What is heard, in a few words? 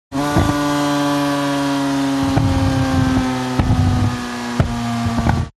MOTORCYCLE Sport Bike Short Deceleration; TRANSPORTATION; smartsound